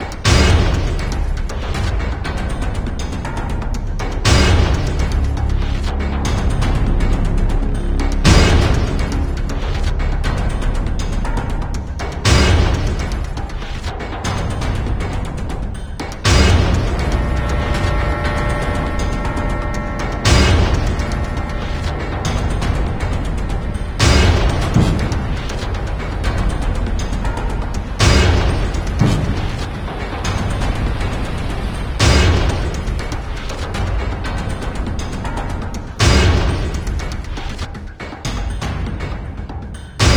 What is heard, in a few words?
action, music; russian sounds